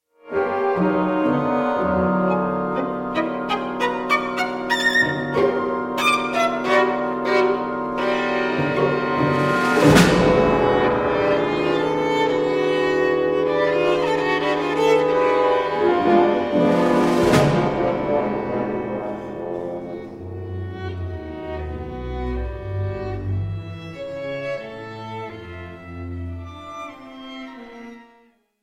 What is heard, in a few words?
concerto for violin and orchestra; Alban Berg